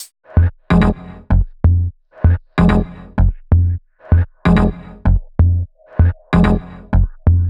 Melody 128 BPM Root F; Vengeance Samples; Melody Kits

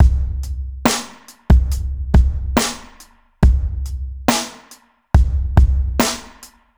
Slomo Beat 140 BPM, Loops, Slow Motion Beats

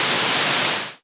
Cymbals HH Open; Vengeance Samples

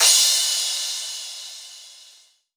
Crash; Vengeance Samples; VES1 Cymbals

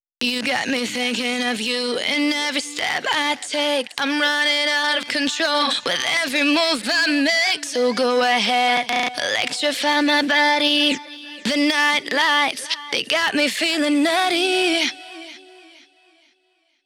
Female Vocals Vers WET, 128 BPM; Vengeance Samples; VTDS2 Song Kits

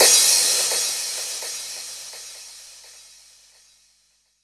Crash, Cymbals; Vengeance Samples; VEH2

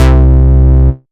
Bass C, Offbeat Bass

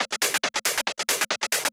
Loop 138BPM, Drums & Perc; G#minor

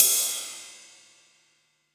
Cymbals Ride; Vengeance Samples

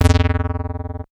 Synth Bass; Vengeance Samples; VEE Synth Shots

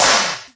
Claps; Vengeance Samples

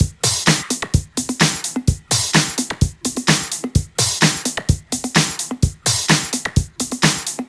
128BPM Kit; Vengeance Samples; VFH1 128BPM Basique Ale Kit

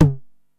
TOM H, 909; musicradar-synth-samples; Classic drum machines; 909TOMS

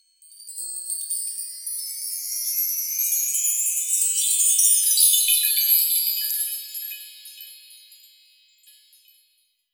Wind Chime; Percs

